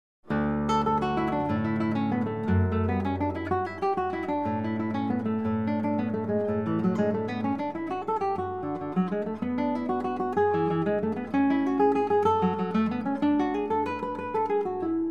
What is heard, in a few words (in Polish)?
courante, gitara; M. Ponce